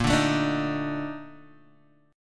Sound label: A sharp Major 11th A sharp Guitar Open G